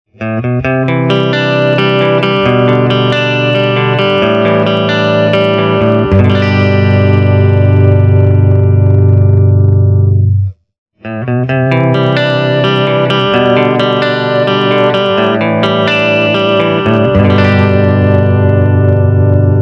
tri vibe, Rate 15, Depth 14, clean - Vibe - Whirl - Swirl; pretty guitar - 22/7 - Ampeg Rocket II - mic - mixer; bridge Tele pup